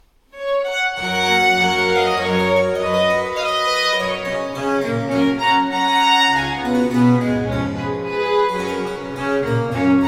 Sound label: Sinfonia in D major Andante